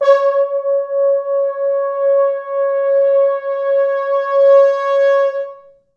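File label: 4 French Horns, Horns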